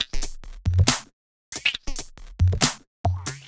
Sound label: Bpm138 Flanged Top Loop; Perc Loops